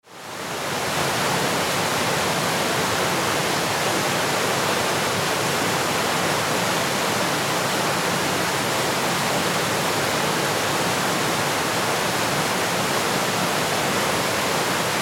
waterfall

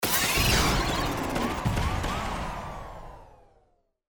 Burst Digital

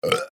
Burp

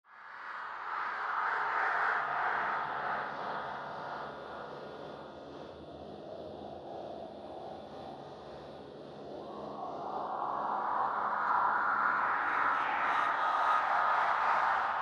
Sweeper Airy

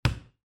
ball bounce single

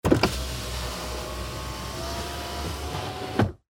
Car Window Rolling Down

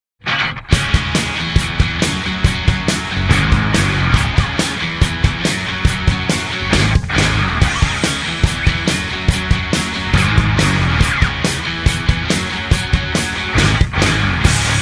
Straight Rock; Soundzabound Volume 5 Disc 2

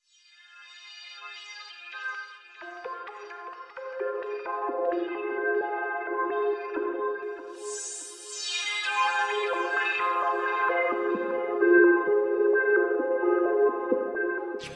Sancturary Instrumental Version

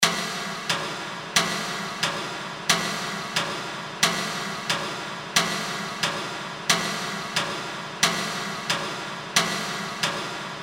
Clock Tick Eerie

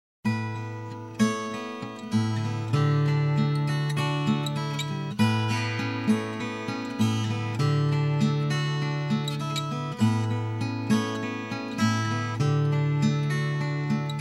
Acoustic Guitar; Soundzabound Volume 1

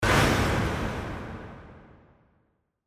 Burst Reverb

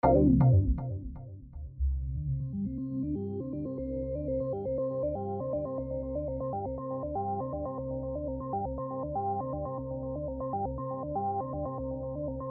Synth

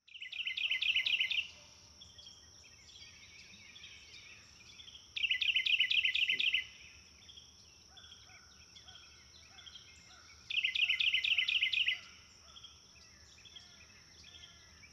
woods

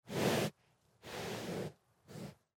horse breathing

